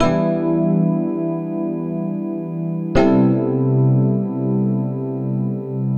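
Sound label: Electric Piano; Keys; Keyboards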